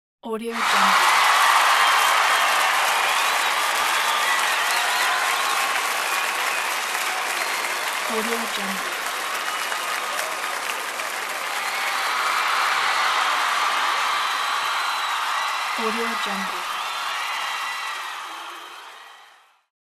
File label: crowd cheering and clapping